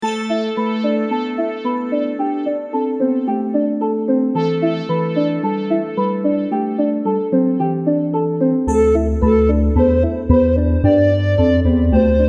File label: flutes